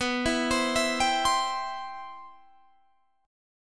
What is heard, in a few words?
C Major B